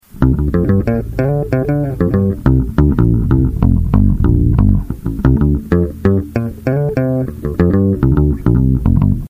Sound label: Bass